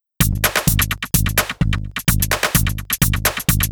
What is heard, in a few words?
Beat 128BPM (Full No Kick), techno; techno drum fx